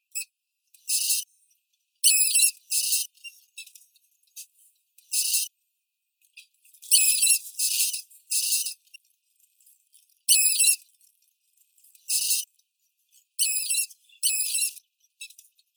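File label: brown rat, Rattus norvegicus; krisa seraya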